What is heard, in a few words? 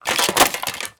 item pickup